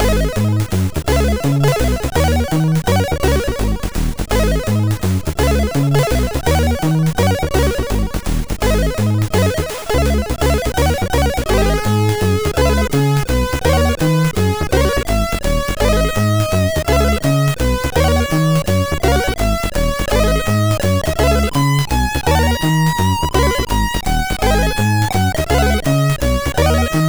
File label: Lowland Jazz, sid MOS6581R4; hvsc